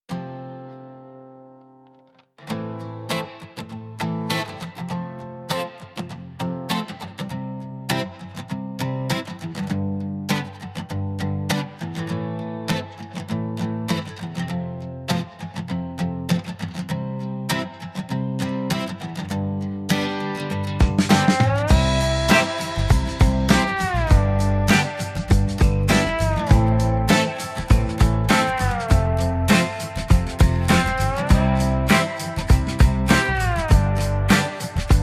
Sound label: instrumental